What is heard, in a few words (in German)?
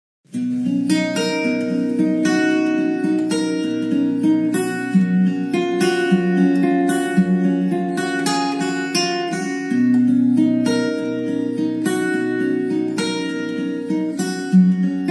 Romantische Melodie